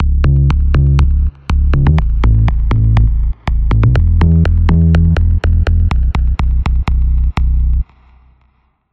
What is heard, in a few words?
BIG SUB